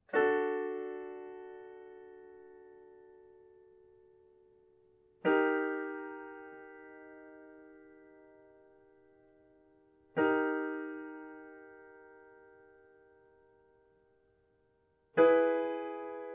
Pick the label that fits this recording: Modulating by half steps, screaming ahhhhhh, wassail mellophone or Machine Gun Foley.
Modulating by half steps